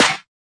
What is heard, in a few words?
squeezle clap; Claps